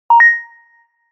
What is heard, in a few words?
Level Up, notifications